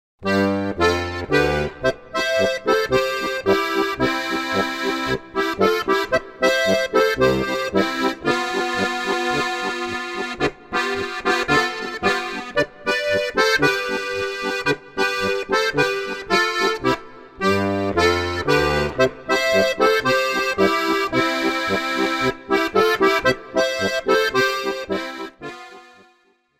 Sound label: Polka